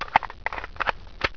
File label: LOAD, 枪炮声音素材